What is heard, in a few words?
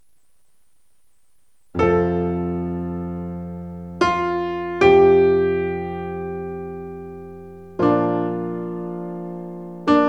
Melody